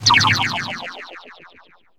SONG FAILED; maimai

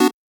Chord

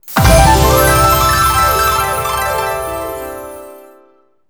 SE DANI UP; maimai

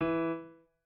piano

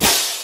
Hat

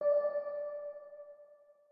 organ

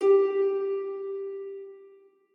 harp

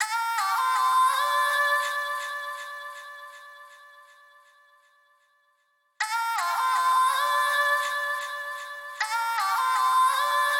vox

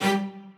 strings

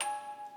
musicbox

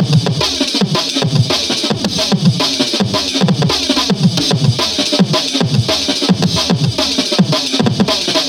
Dr Loop break

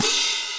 cymbal

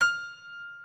piano

